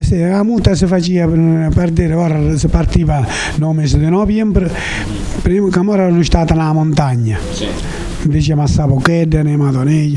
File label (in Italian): Soprana; muta